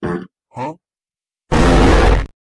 FART HUH; memes

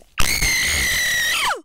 screaming again; Memes

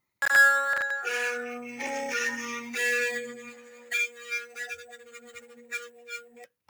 bear ambiance; Memes

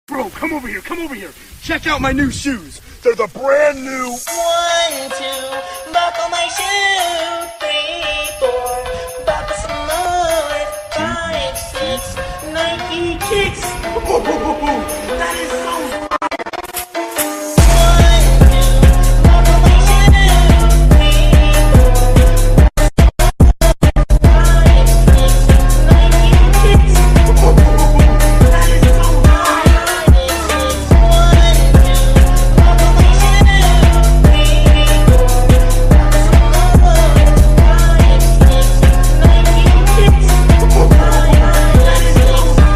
ONE TWO BUCKLE MY SHOEE PHONK; memes

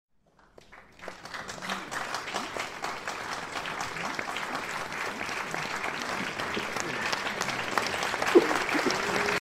large crowd applause

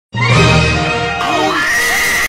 reee

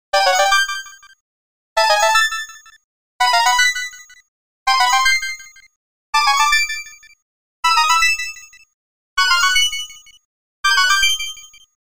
red coin; super mario 64; Memes

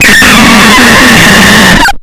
jumpscare sound; bonnie; FNAF

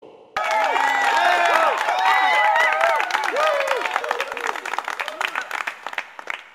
APPLAUSE WITH CHEERS; WII; reactions

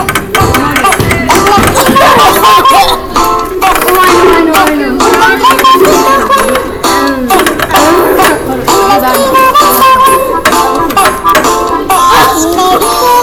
SONGS

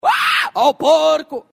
weeeee oh o poooorco

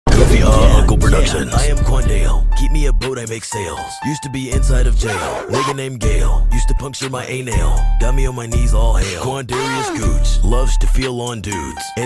RAP

